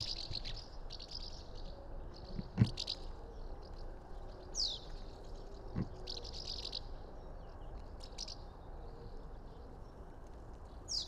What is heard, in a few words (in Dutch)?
Huiszwaluw Delichon urbicum